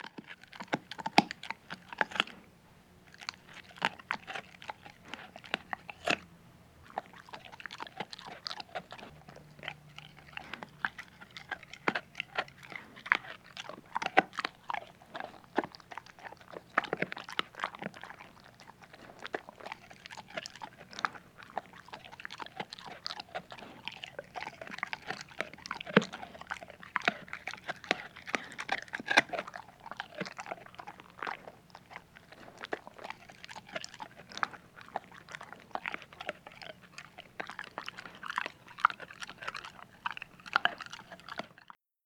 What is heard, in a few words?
Dog Eating Crunching